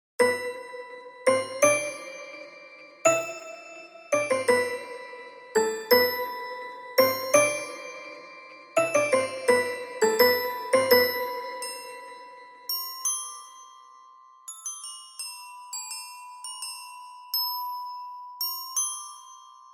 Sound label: glockenspiel